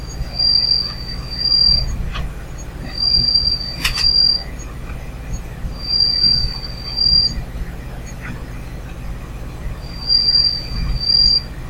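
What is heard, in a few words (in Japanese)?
suzumusi, bell cricket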